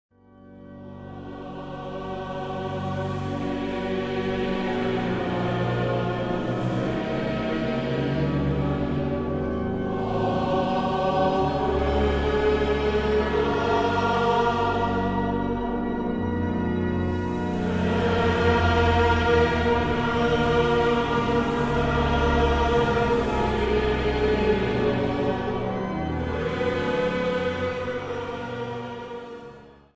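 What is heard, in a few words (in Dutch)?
Psalm, orgel; Nijmegen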